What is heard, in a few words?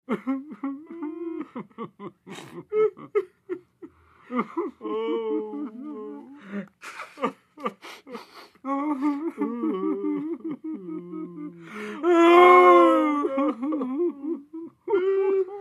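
Male Sobbing Two Men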